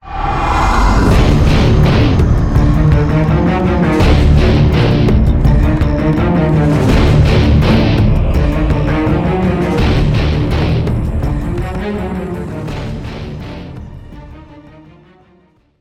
spawn

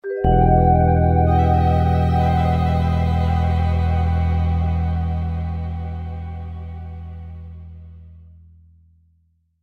Mission Failed; I Expect You To Die

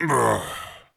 death Man

